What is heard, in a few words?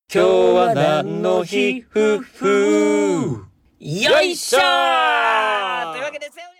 shake